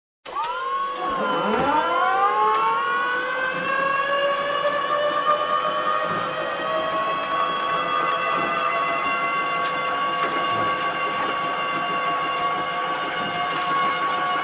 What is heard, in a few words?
Planer Machine Turned on